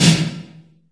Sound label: LARGE ROOM 808, REVRB